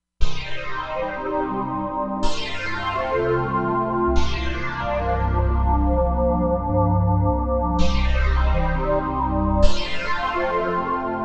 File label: Wave Sweep, Korg MSC-07S Synth